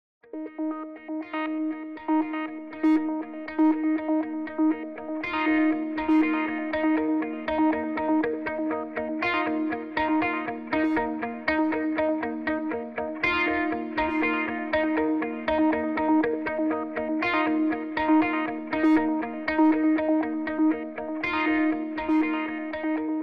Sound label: Softube Vocoder Guitar FX